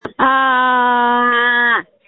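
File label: Scream, phone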